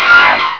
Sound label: Rust Monster death; Mythos